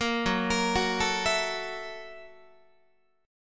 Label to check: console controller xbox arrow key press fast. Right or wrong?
Wrong